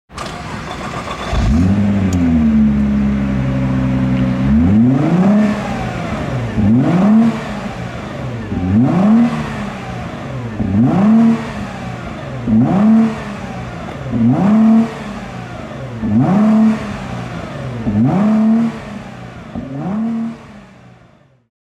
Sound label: Nissan GTR R35 VR38DETT